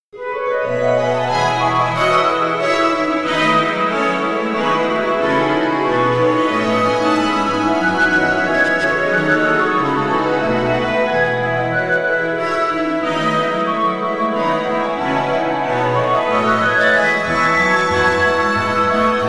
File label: Concertino Flute Harp